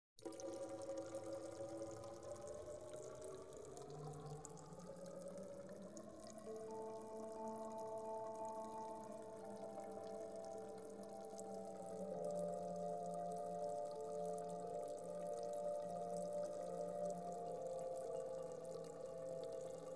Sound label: sound effects ancient greek temple; Greek Archaia